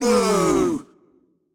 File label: Crowd Boo; Pitch Black Audio Pong